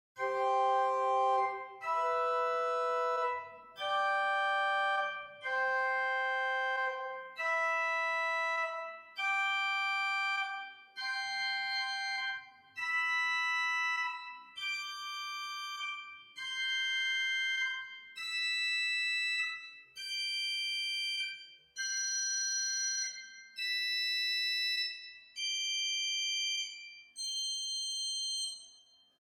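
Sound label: Great Mixture arpeggio, organ stops; Hauptwerk; St Annes Moseley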